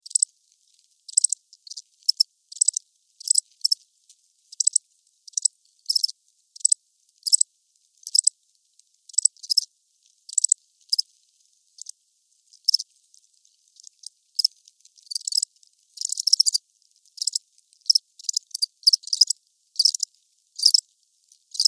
Crickets Chirps, Crickets, Chirps, Movement, Close, Insect, Bug; Sound Effects:Animals:Insects; Blastwave